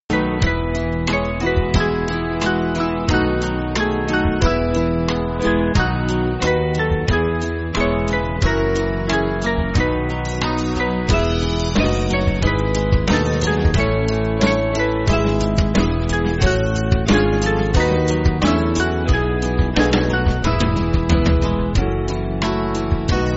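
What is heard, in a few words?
Music Backing Files for Churches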